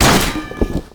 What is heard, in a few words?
Umbrella Stand Destroy; Warframe; Ambience; Nineteen Ninety Nine